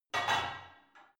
barbell only replace unsteady reverby, fitnessstudio; sport freizeit